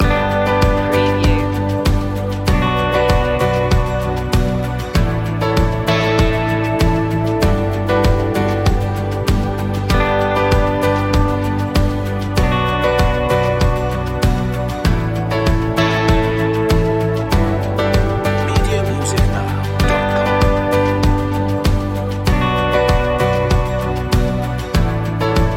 petra k loop

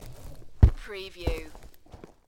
DINOSAUR STEPS

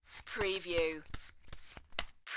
Cards Playing Cards Shuffling

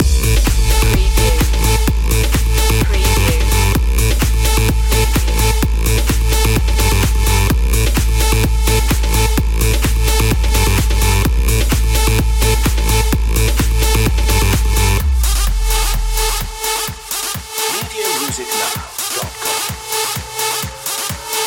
party drop

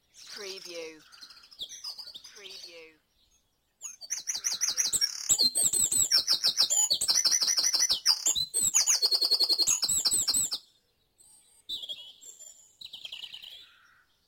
Dawn Chorus in Mold North Wales - Short Loop

